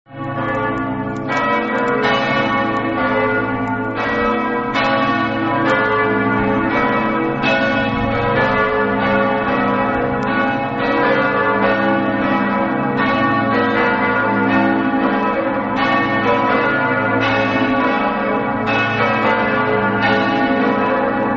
udine, campane

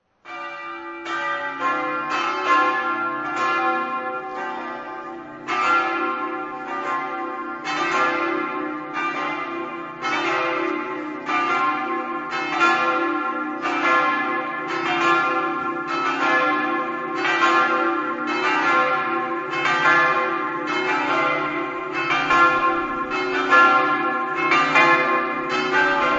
rivignano, campane

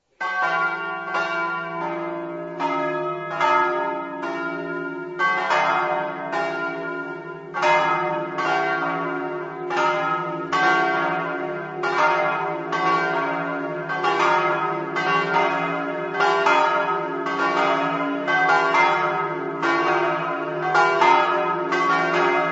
trivignano, campane

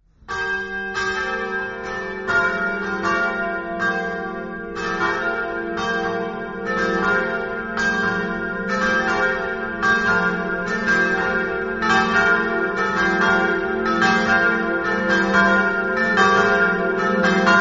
glemone, campane

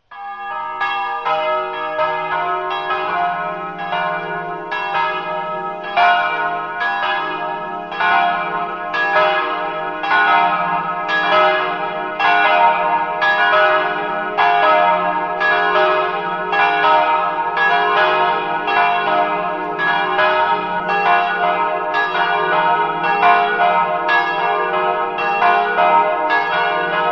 savogna, campane

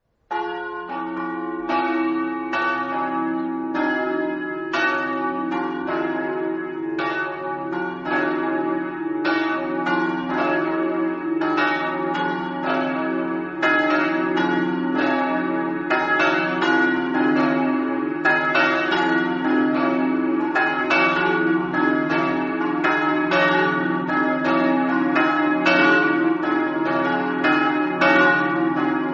zugliano, campane

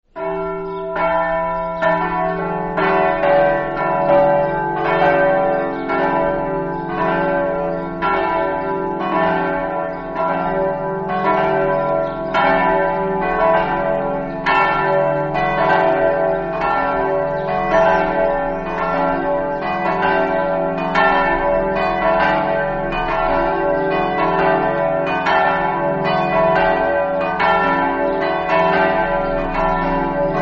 masarolis, campane

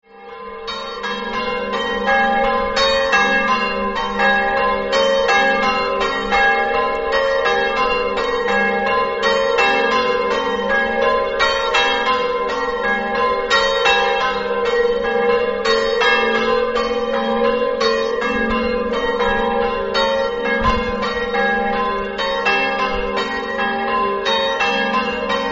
campane tarcento

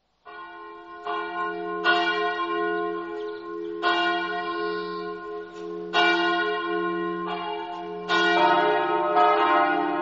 barbana, campane

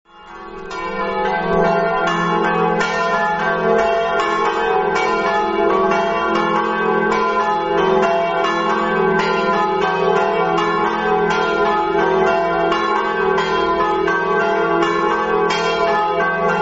palma, campane

